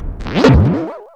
Koopaling Enter Castle

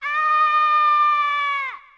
Aaaaaaah; toad; mario kart 64

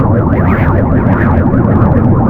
Poison Gas

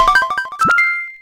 Toad House Item Get; Wii

